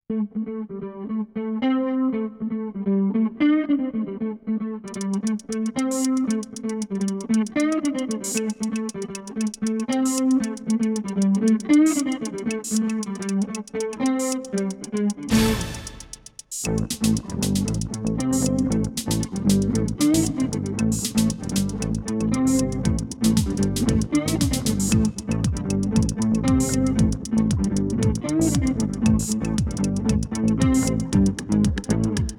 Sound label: Thirsty Blues 116 bpm